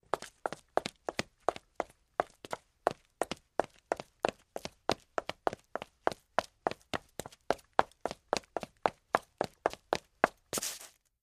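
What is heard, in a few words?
Footsteps Female Run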